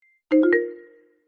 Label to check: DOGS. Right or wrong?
Wrong